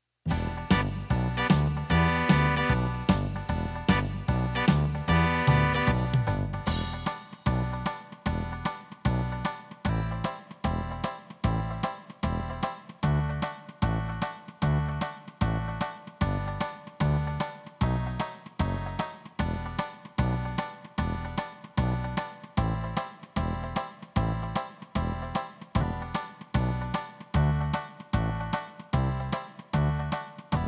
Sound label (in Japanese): 拙い伴奏